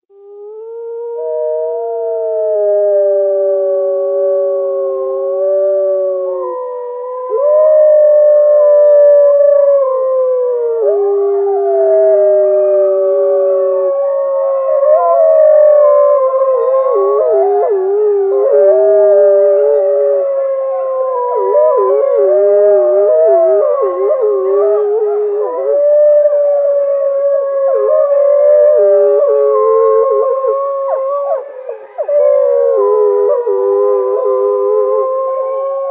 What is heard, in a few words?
wolfpack